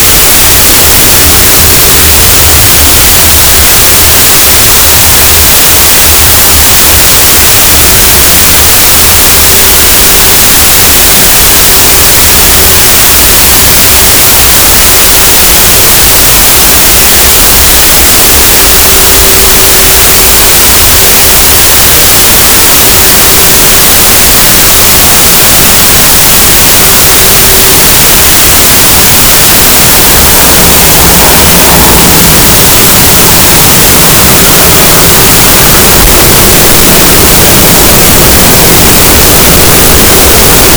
cachalot negatif, Physeter Macrocephalus, gaussian noise